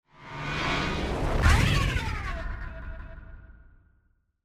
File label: Burst Whoosh